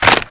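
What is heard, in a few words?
Stalker Movement Synced Melee Facepunch Armbreak; Titanfall 2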